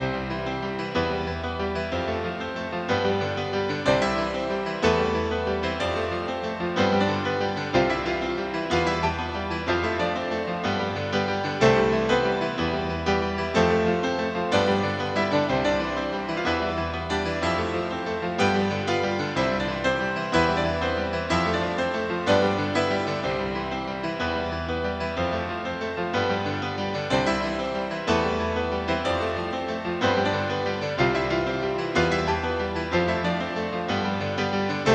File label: excited piano